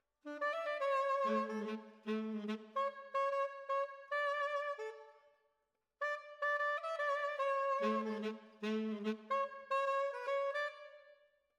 Brass Over

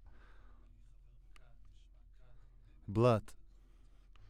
Voc OD

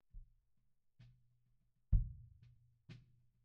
Kick In, 96bpm

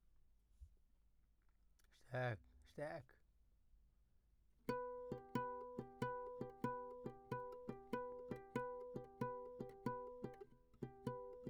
Classic Guitar

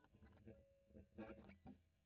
E. GTR Royer

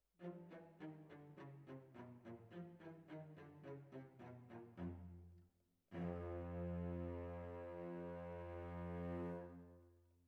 Violas Over

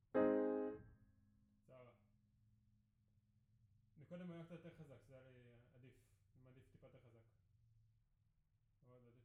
Piano B

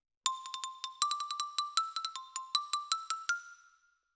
Xylo Close

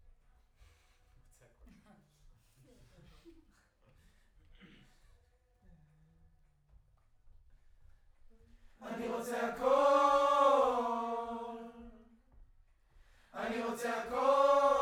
Choir Far

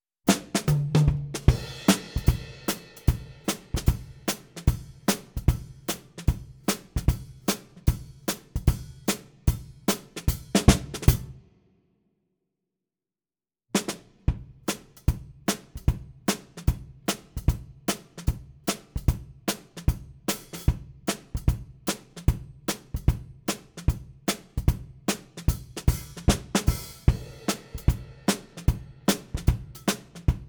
Ragtime drums

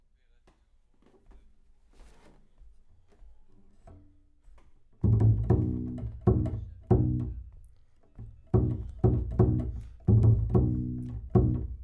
Bass

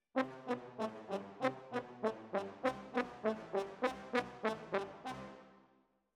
Horns

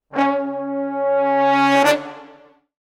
Brass, Jazz for piano

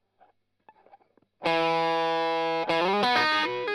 Strum Line

